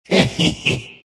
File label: nyx laugh; dota; nyx assassin